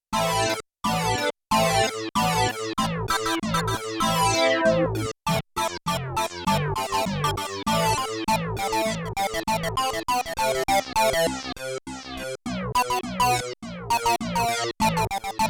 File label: Dual VCF Brass, Prophet 5; Yamaha TX16W